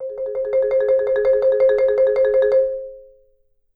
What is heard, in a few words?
Marimba roll C4; Sampleheads - Dave Samuels Marimba & Vibes